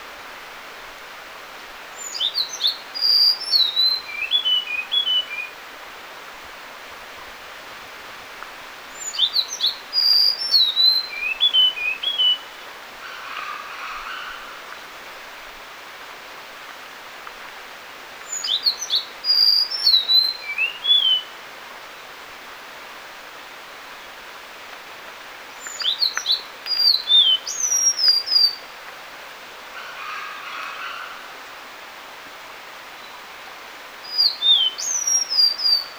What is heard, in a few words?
Nilgiri Blue Robin Sholicola major, nilgiri blue robin call